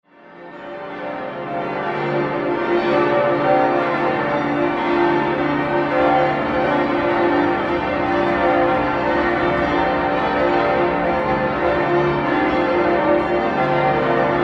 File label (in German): Glocken Dom Wuerzburg